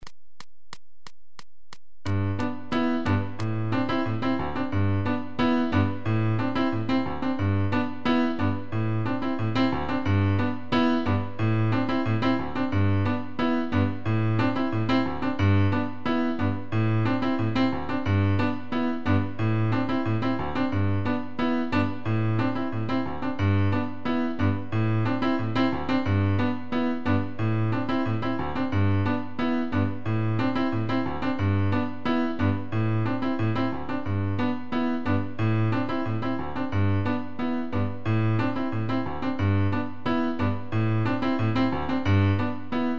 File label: Rumba; Seiber; Rumba Bis Walzer